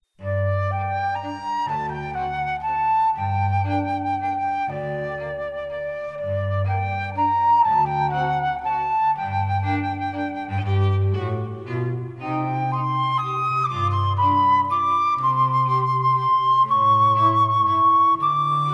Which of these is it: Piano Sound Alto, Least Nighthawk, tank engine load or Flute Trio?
Flute Trio